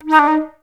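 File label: flutes D#3; Best Service; Peter Siedlaczek's Advanced Orchestra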